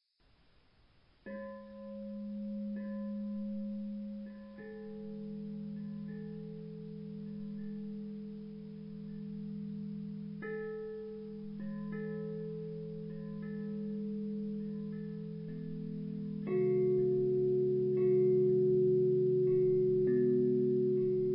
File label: Blissful Bells for Meditation